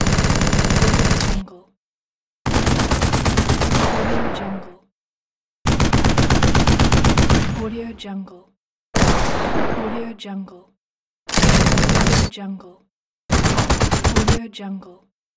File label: machine gun shooting